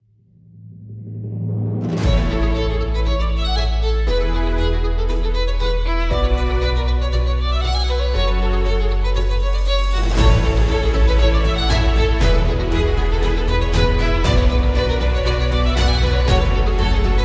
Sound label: Instrumental Happy Musics